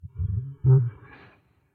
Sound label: growl, Shadow giant grows and grumbles; Hound of Tindalos; SyrinScape; Iris hill manor